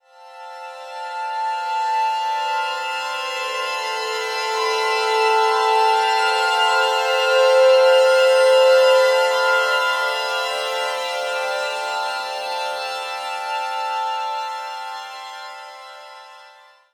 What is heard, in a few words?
Psaltry Clouds; Creative Software; Ambient Atmospheres & Rhythms; Drones Abstract; Ambient Drones+Abstracts